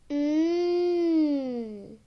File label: Mmm Girl